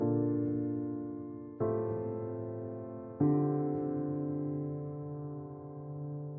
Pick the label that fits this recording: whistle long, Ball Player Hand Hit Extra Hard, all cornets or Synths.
Synths